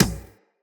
Beach Ball Kick; WaterFight; Beach Ball Floof

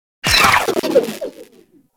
Seeker Drone Death; Eidolon Tusk

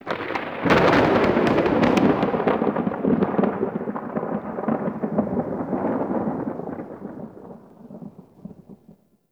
Thunder Crack; Warframe; Tau

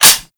Magnum Reload Hit; Warframe; Tenno

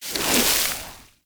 Hoverboard Terrain Caster Snow; Warframe; Vehicles; Venus

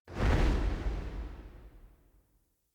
Player Aim Jump Start

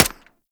Arch Long Rifle Mech; Warframe; Weapons; Archwing

